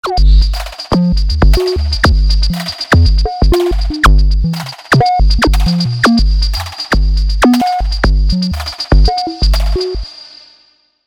filter

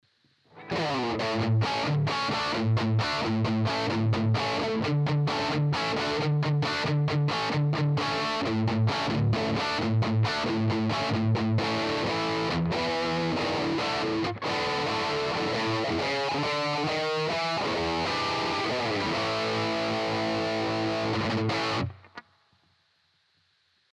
EHX 5150 Clean Overdrive L3 DI Out

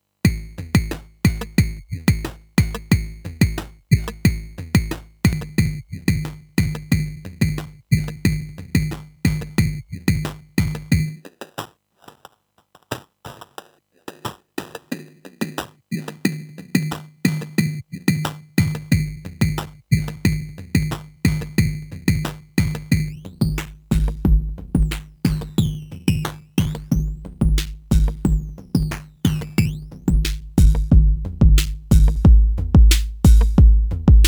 TR8 MX1 Chiptune

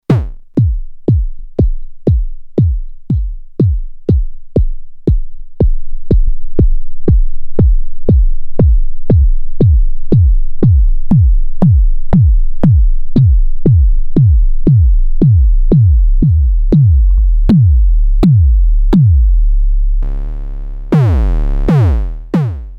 bassdrum; soma the pipe

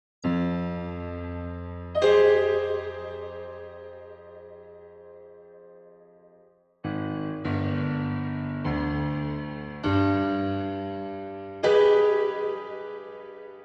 Dynamic Guitar Room; Tverb

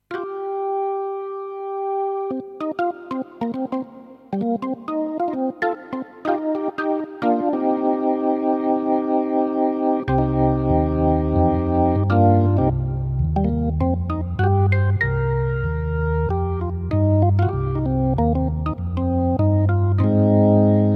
Kronos GP Nice Perc

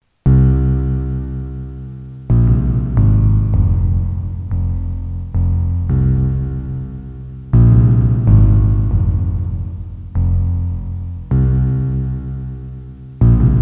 Microfreak cello cloud engine

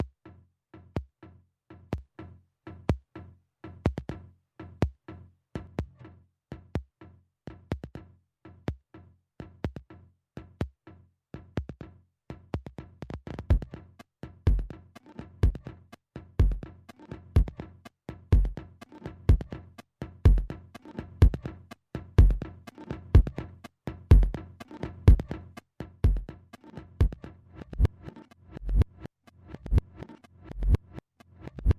radius efx flang

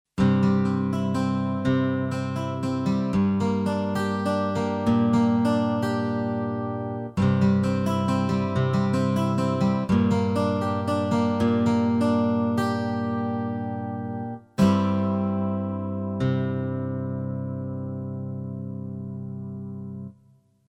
Charlie Lab Digitar

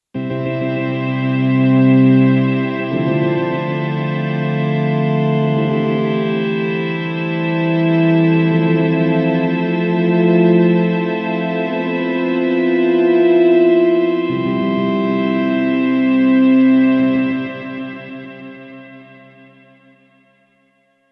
Vox Pad

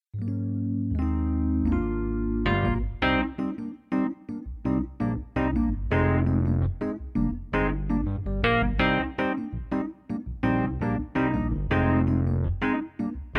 Clavia Nord Grand EP4 Dyno Chorus

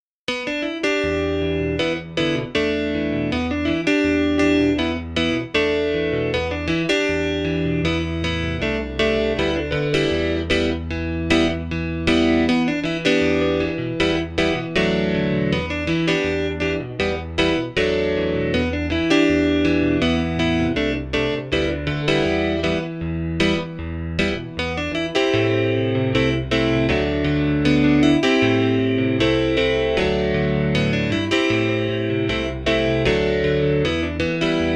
Nord Grand Electric Grand

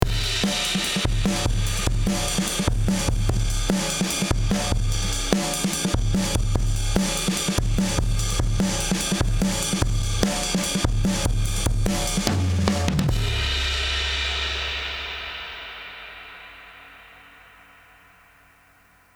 Drumgroup fx comp Limit; Heritage Audio HA-609A

